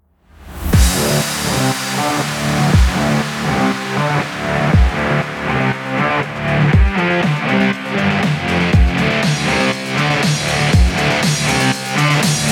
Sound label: stock music